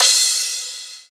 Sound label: Crash, Cymbals; Vengeance Samples; VEH2